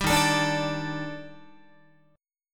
D sharp Major Sharp 11th D sharp Guitar, chord; strummed; 4A,4E,5A,5D,5G#,6C#; 10,11,12,12,13,13; JGuitar

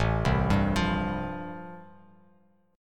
A-Minor 7th Sharp 5th, Bass Standard, chord; Am7#5 arpeggiated; JGuitar

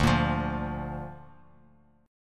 D Minor Sharp 5th D down Guitar Open D

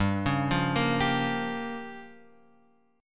G Minor 7th Flat 5th, Guitar Open F; Gm7b5 arpeggiated; JGuitar

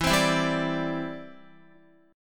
E Minor 7th Sharp 5th, down, Guitar; Em7#5; strummed

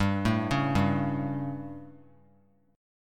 G Suspended 2nd Flat 5th G, chord; Gsus2b5 arpeggiated; JGuitar

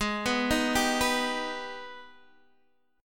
Ab Minor Major 7th, down, Guitar, Open D

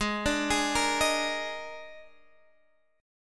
Eb Major 7th Suspended 4th; EbM7sus4/Ab; arpeggiated; JGuitar

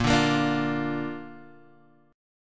A sharp Major, down; strummed; chord; JGuitar

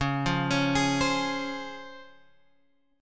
C sharp Major 7th C sharp Guitar Open G

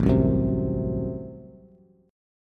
A Minor 6th A Bass; Am6 strummed; JGuitar

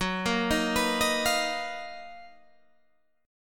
B Major Sharp 11th F sharp

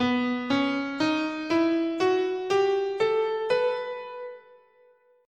B Fifth Mode, scale

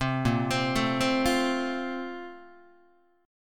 A sharp Major 7th Suspended 2nd, Guitar; A#M7sus2; arpeggiated; JGuitar

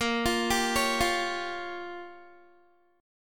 A sharp Minor 6th A sharp down Guitar, chord; A#m6; arpeggiated

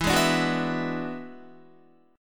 E 9th E down Guitar; E9 strummed

